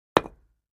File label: Patio Block Hit Sledg, concrete & brick